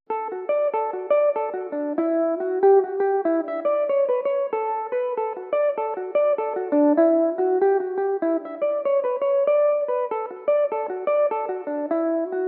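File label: D major belmuse, irish mandolin; setting sun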